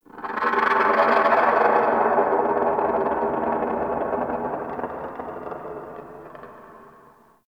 Beast Cry; Warframe; Tau

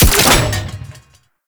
Exalted Sniper Alt Fire Mech; Frumentarius

